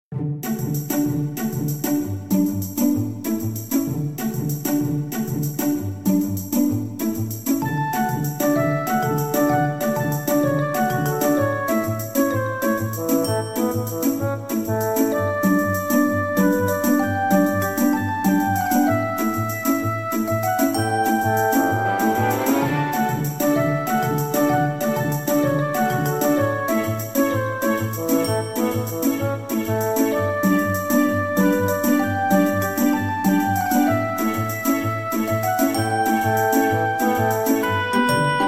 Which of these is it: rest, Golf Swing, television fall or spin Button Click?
rest